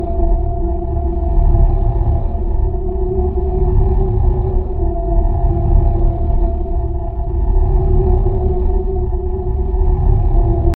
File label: PULSATING ARCHWAY AND STATUE LOOP; CRYSTAL SPAWNER SFX; Dungeon Defenders Eternity